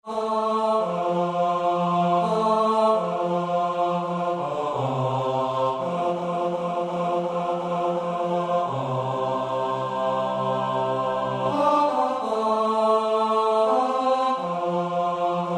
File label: Swing Low